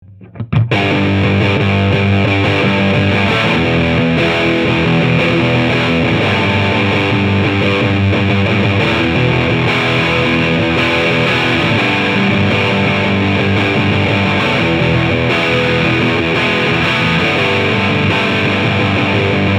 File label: guitar